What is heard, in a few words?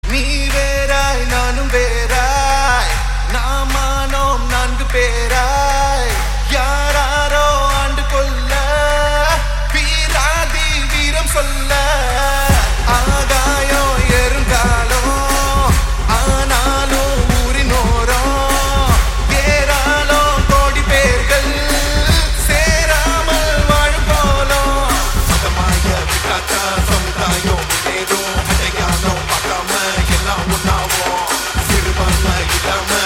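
background music ringtone; maanaadu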